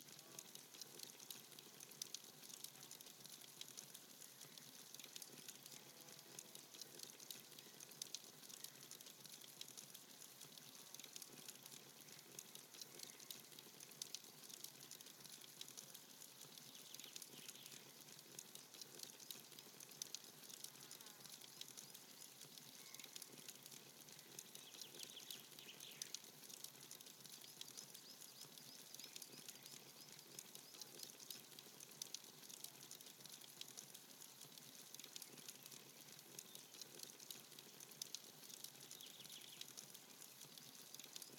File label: AMB; BLIND QUEST - The Enchanted Castle